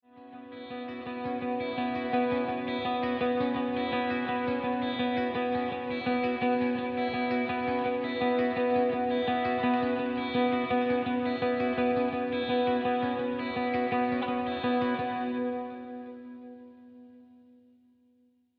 Guitar Intro

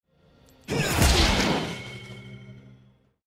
Ult No Dialogue

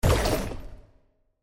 Rock W; Qiyana

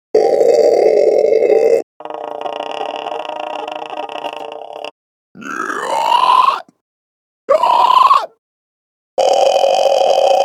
Raw Vocals for Plant Dragons

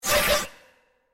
Grass W; Qiyana

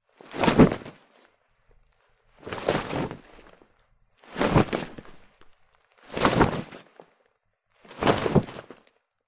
Foley Passive Attack Leather Whoosh; Xayah

